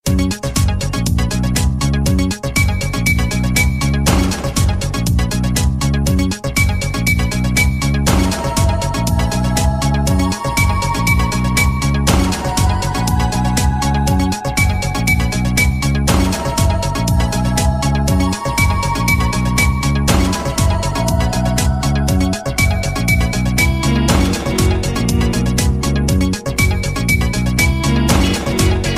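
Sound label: ringtone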